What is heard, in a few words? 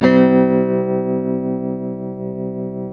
TELE CHORDS MAJ C#, Guitars; Spectrasonics; Hans Zimmer Guitars VOL-1